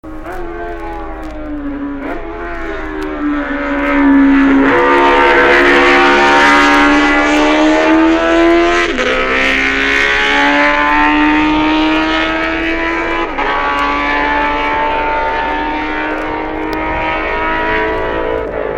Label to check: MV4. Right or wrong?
Right